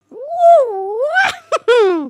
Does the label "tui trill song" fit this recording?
No